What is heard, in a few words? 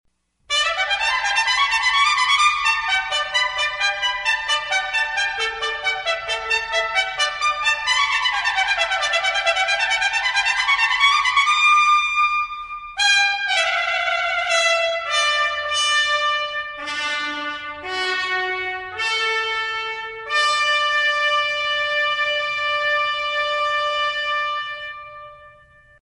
fanfare ringtone; cbs news sunday morning